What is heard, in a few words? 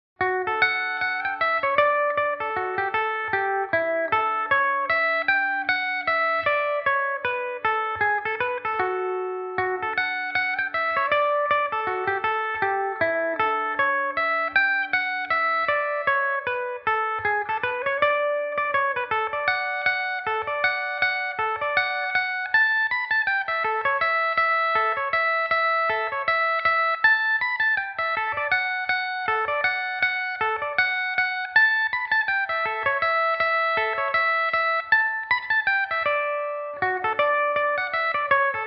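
D major belmuse, irish mandolin; mrs kellys chickens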